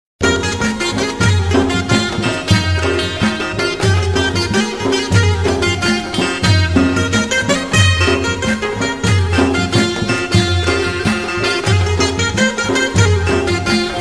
Sitaar

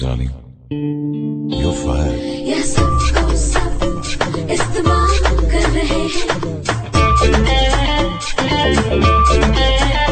Corporate

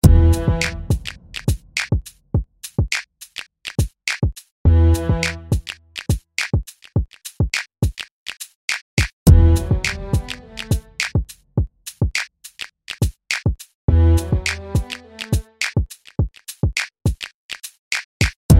Rhythm n Violin

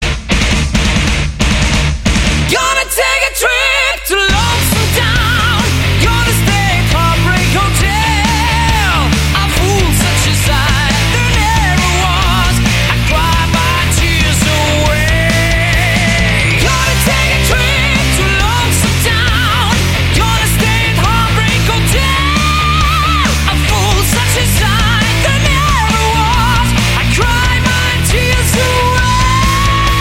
Metal remix

